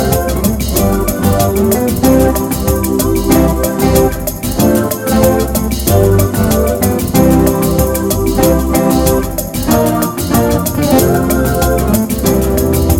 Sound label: Super Bossa Nova